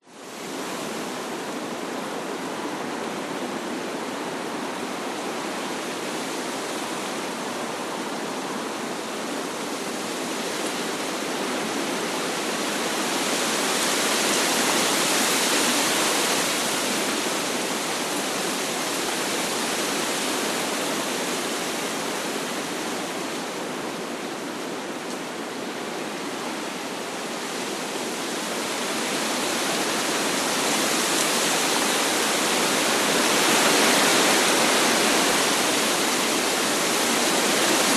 wind strong tall trees storm; nature

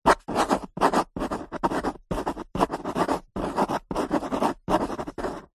pen writing on card back of paper pad; office

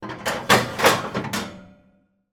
oven grill tray place in grill

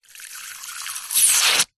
foley balloon pump in water single spray out; zapsplat

